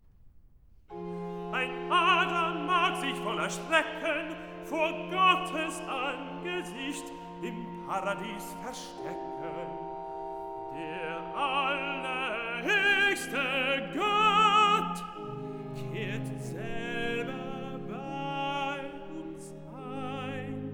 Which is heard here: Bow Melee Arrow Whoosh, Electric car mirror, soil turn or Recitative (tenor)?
Recitative (tenor)